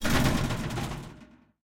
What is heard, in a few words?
shootrattle end